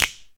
clap f sharp key, Royality free hand clap sound tuned to the F# note. Loudest frequency: 3748Hz; percussion; single hits one shots